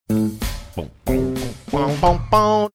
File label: singing along funky bass; blursdays